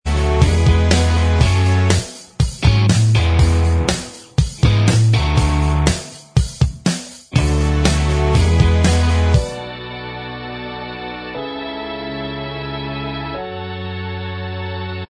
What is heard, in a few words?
Inst